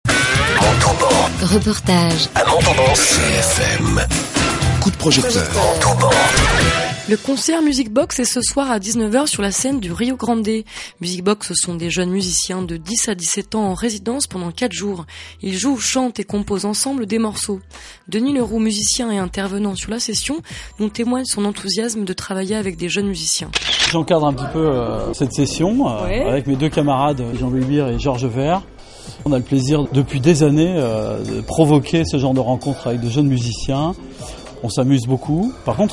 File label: Music Box